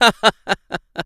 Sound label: laugh; Eternal Return; ENG